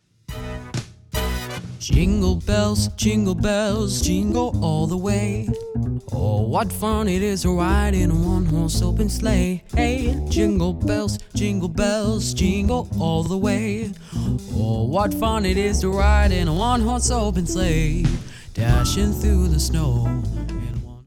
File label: Jingle Bells; covid christmas audio cd